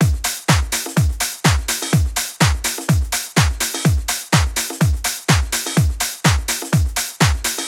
Housy; Magix Sound Pool 5 Collection

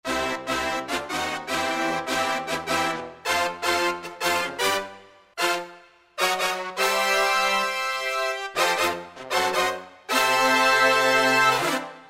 Ray Brass, Roland JD-990; Factory Patches

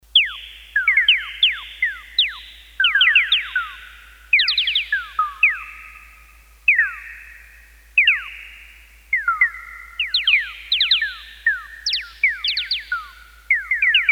Tweeting Bird, Roland D-10; Factory Patches

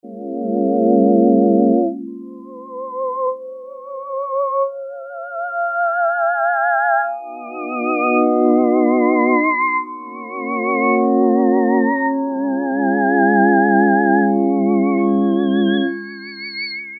VOICES, Yamaha DX7

